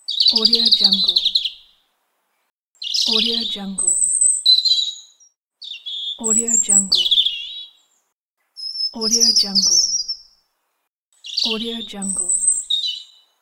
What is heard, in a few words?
robin call